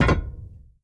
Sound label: mech, player steps; quake2